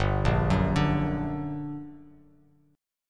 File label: G Minor Major 7th G down Bass Standard, GmM7 chord; arpeggiated; JGuitar